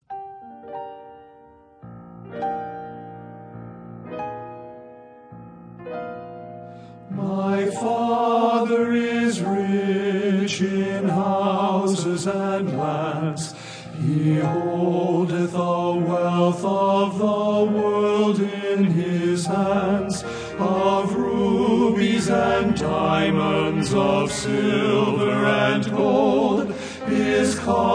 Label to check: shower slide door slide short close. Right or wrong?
Wrong